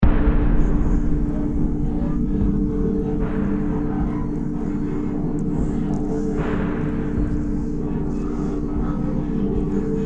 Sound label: Dungeon Metallic Pulsing Tonal Drone; Dungeon Tonal Metal Impacts Dark Eerie Scary Distant Voices Drone; Horror; Scary Soundscapes